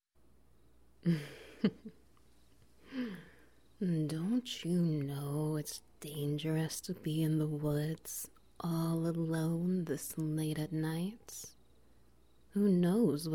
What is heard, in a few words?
Ambience Wet Noises